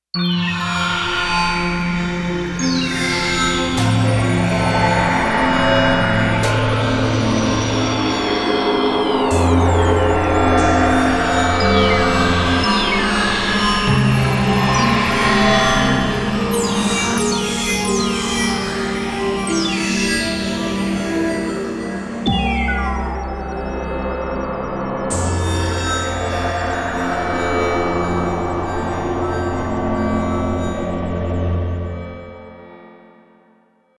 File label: FX Menu